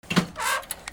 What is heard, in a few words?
OFFICE